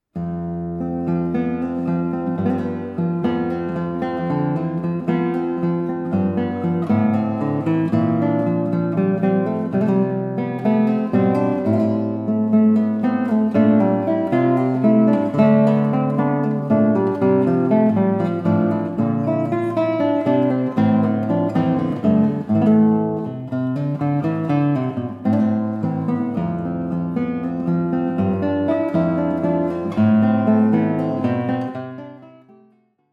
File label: Levantine suite dance